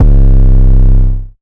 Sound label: Fuzz 808; Cymatics